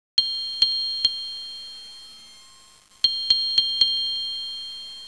GLAS KLOP; 999wavs

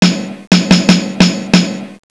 MARSCH

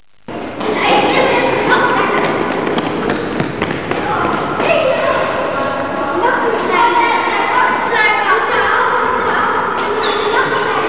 Kinder

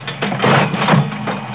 HEBEL; DRAGO

